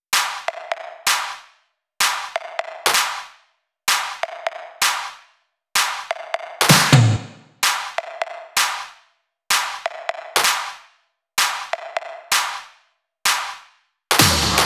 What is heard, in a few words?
Loops 128BPM, Drumloops